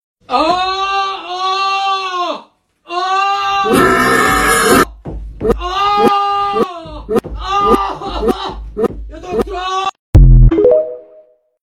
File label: huge aaaa